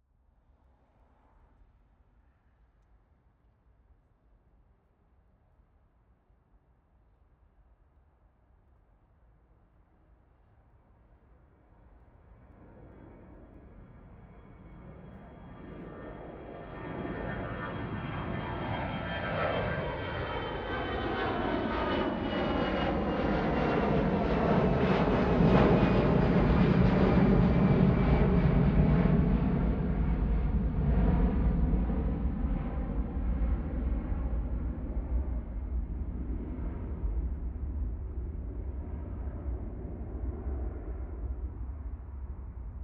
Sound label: Planes - Takeoff - BOOM; aXLsound; Planes - Takeoffs and Landings